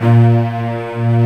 A#1 C-BASS; AKAI S6000 CD-ROM - Volume 1; Strings

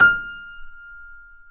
Mf F 2 off, Grand Piano off mic; AKAI S6000 CD-ROM - Volume 2

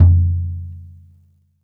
bop kick - snares off; JazzFunkKit

